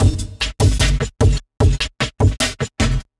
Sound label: Drop Beat, Drum N Bass; Sonic Foundry (Sony Creative Software); Knutrix Drum & Bass